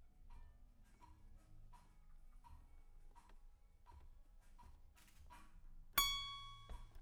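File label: guitar Mic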